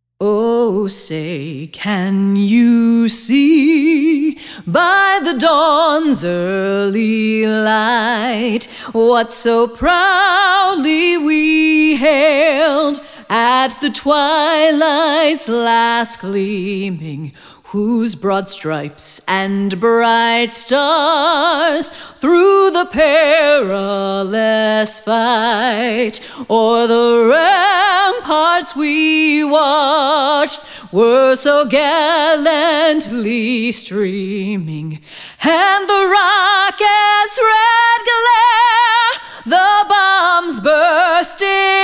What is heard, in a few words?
National Anthem